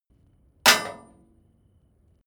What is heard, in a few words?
metal impact bright short loose